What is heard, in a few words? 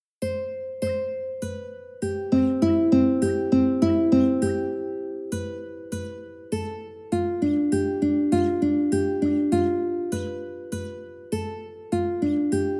o sole mio ukulele